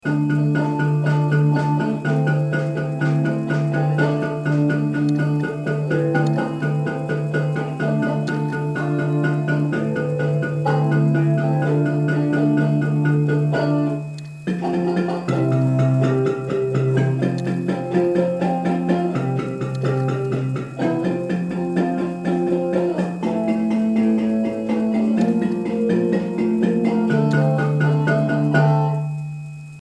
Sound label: bass, marimba; carnival